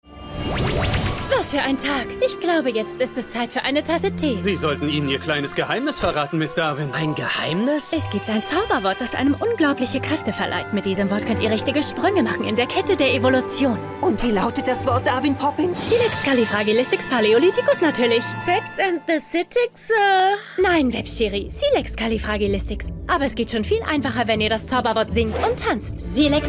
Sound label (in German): Gesang; Silex And The City